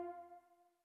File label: Loop Tail; Good Job